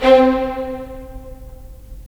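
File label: strings violins, left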